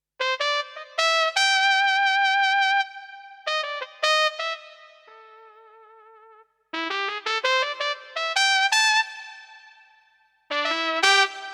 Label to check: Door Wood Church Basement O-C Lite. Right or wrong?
Wrong